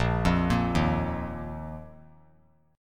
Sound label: Bb Suspended 4th Bb down, chord; Bbsus4 arpeggiated